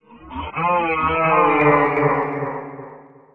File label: CRYING